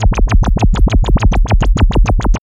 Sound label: SYN FX; X-Static Goldmine 1